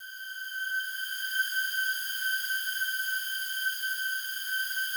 slow strings G5, Yamaha VP-1; Music Store; 90's Sample CDs

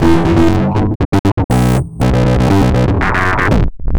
Processed Electric Bass

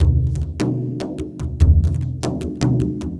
Frame Drum Harmo, Ethnic Percussion; Sonic Foundry (Sony Creative Software); Ambient Atmospheres & Rhythms

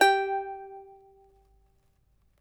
charango G3; Spectrasonics; Hans Zimmer Guitars VOL-2

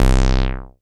SYN TB SUB, Roland TB-303; Sound & Vision - Gigapack I CD 2

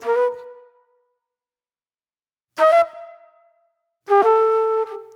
Mellow Flute

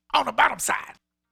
Funky Mama Vocal, 70s Analog Funk; The Funky Mama; Sonic Foundry (Sony Creative Software); What it Is! 70s Analog Funk

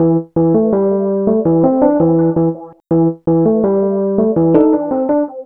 Cop Show, Keyboards; Total Drum&Bass

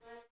Violins A#3; Miroslav Vitous; String Ensembles

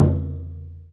SURDO; E-MU Producer Series Vol. 5 – 3-D Audio Collection; 3D Percussives; 3D Ethnics

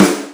SD, drums; Big Fish Audio; Synth City

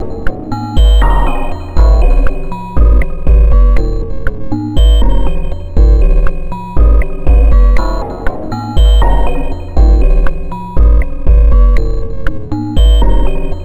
Combination Rhythm; Sonic Foundry (Sony Creative Software); Twine-IDM; Rhythms; Combinations

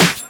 SD, HIP, Dance Mega Drums

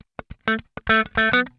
PICK IT, Funk Guitar; Zero G - Funk Guitar

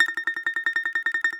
BAGLAMA T1HL; E-MU Producer Series Vol. 7 – Old World Instruments

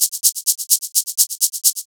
Hihat Loop 128 BPM

